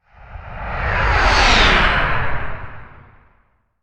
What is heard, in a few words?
Missile flyby deep sonic whoosh